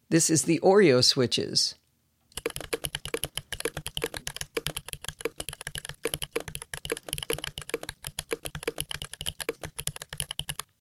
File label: Klack; Oreo